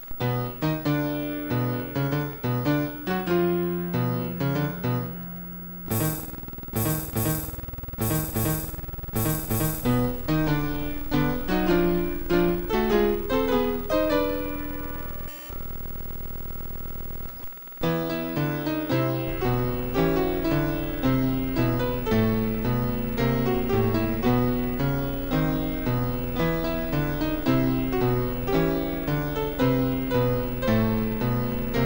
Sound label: AGI MIDI